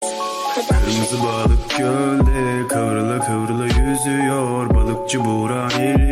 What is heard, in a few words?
remix rap